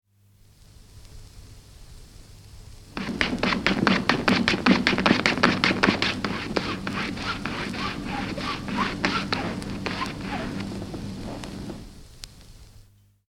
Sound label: Stroppi, Stropping a razor; from 78 disc; Machinery; BBC